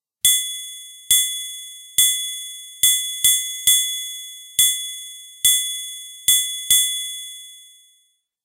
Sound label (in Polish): trojkat; Nagrania Lokomotywy; edukacja wczesnoszkolna